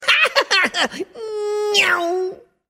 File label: aoooo